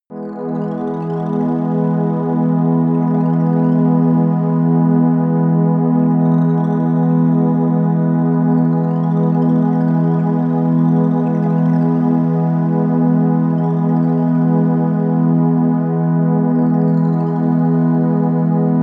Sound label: relaxing energy, music elements